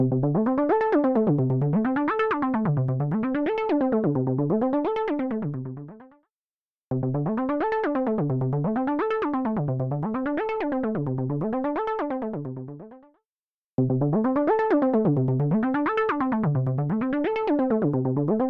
Phase Pan Elation; Preset: Phase Pan Elation; Physion Mk II

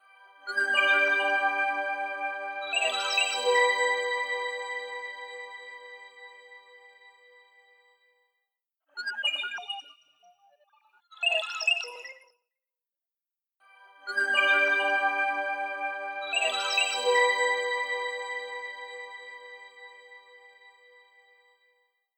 Bird Calls, G Major Chimes; Temperance Lite

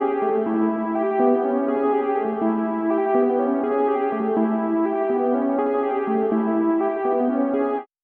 Allpass

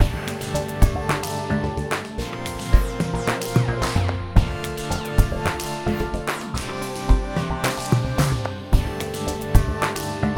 Loud Split

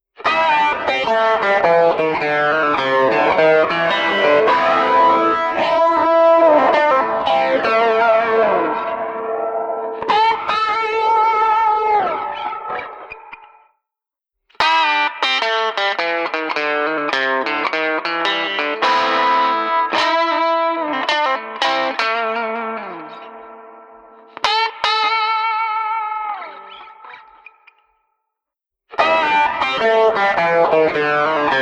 Rotary Mod Swirly Guitar; H9 Bundle; Plug-ins: CrushStation (PunkRock Lobster) + Rotary Mod (Absinthe Swirly)

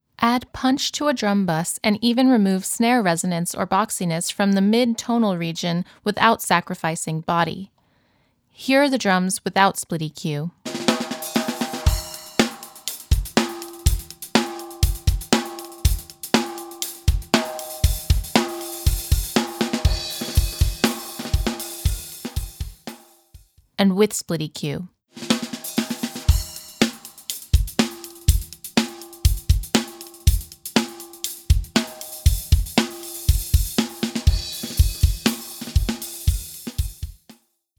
Repair Drums; リペア; ドラム; SplitEQ

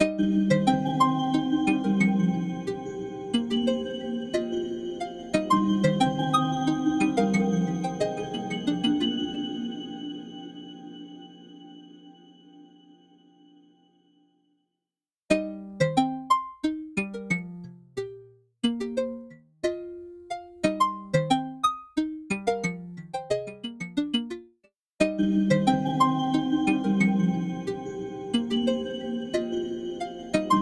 Synth Pluck; Creeperverb; Temperance Lite

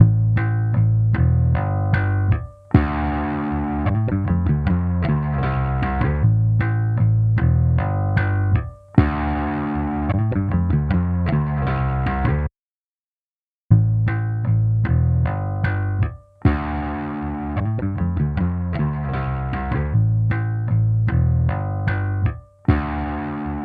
Bass Focus Bass; Omnipressor; Preset: Bass Focus

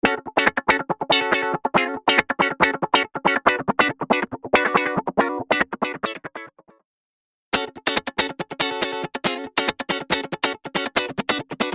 Funk Guitar Auto Wah; H3000 Factory Mk II; Preset: AutoWah

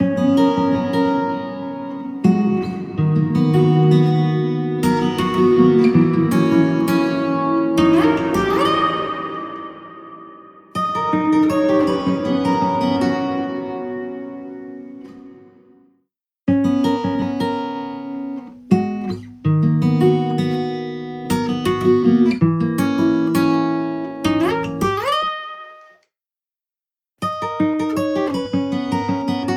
Acoustic Guitar Metro Station Platform Binaural; Blackhole Immersive

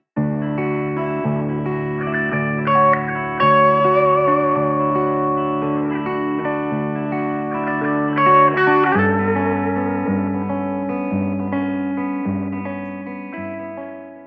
Guitar Vintage Spring Stereo; Blackhole Immersive

